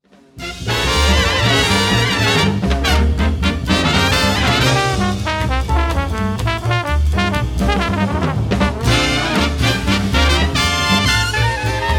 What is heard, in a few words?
Trombones